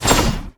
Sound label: mirror fire